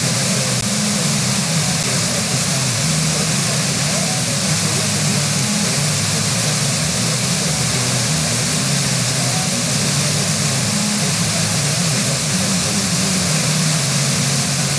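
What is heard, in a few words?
broadcast FM; rsp2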